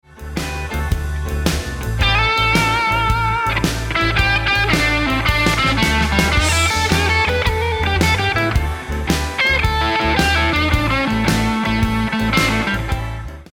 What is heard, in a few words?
Licks Lick, guitar